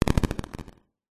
retro game classic explosion 8 bit arcade 80s weapon attack